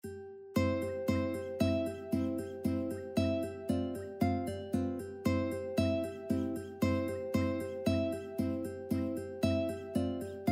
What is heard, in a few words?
duet uke german dance; beethoven